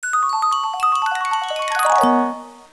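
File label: Ice Bin Chocolate sprinkles fill; Fisher Price Ready for School Kindergarten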